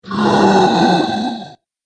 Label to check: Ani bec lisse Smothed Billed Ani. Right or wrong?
Wrong